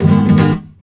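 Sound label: rasgueado A minor, guitars, flamenco; Spectrasonics; Hans Zimmer Guitars VOL-2; FLAMENCO FX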